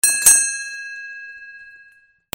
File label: BELLS